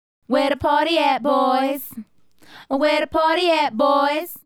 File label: Female Group, Hip-Hop and RnB Vocals